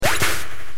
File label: faint; pokemon reborn e19 2022